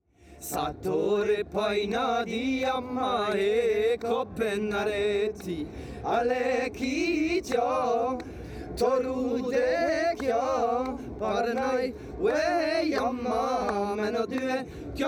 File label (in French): polyphonie